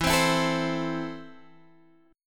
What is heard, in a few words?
E Suspended 4th Sharp 5th